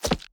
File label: environment object grab bait